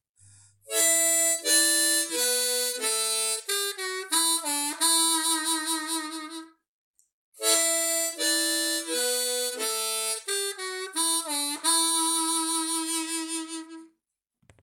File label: octaves tongue, harp